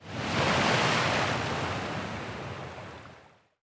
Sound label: ambient battle; World Of Warships